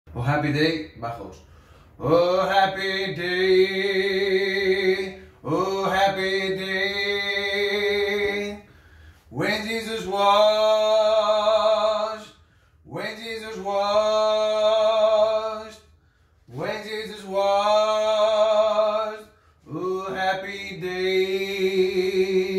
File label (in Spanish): Oh happy day bajos